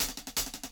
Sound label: HiHat Loop